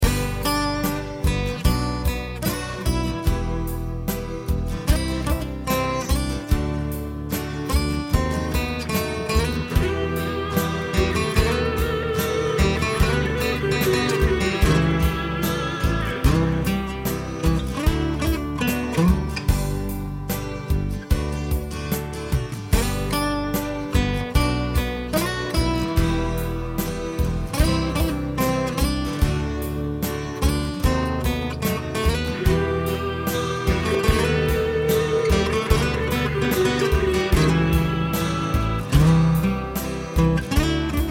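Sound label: Dowina J-999S acoustic; sladak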